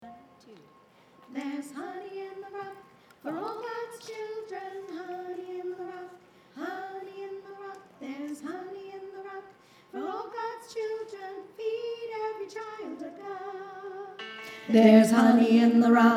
honey in the rock tenor

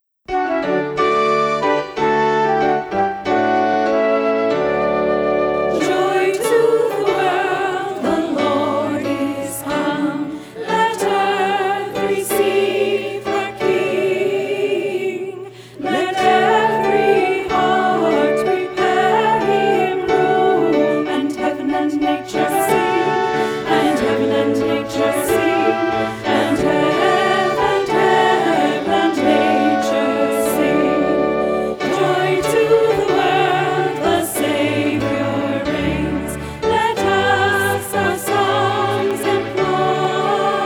alto joy to the world

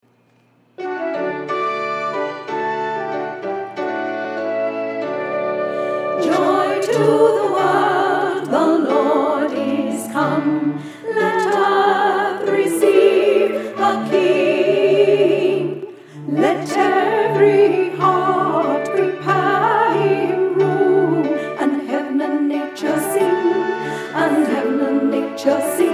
joy to the world alto

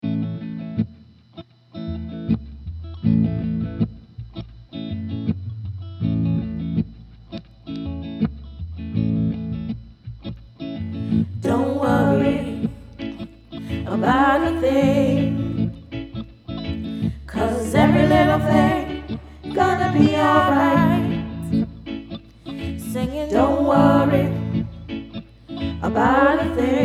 tenor bass tracks

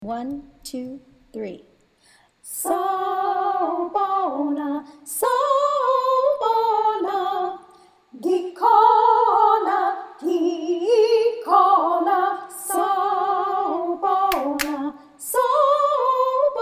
sawubona all parts

